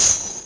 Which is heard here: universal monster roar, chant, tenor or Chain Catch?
Chain Catch